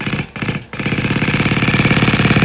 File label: Jackhammer; Working Model Program